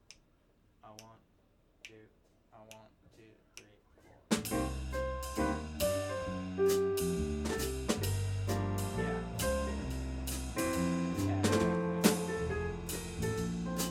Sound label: jazz Broken Beat